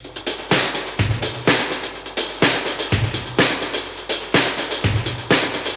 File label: 125 Classic Breaks, 125